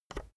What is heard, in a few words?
keyboard external enter push soft